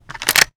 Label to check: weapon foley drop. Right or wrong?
Right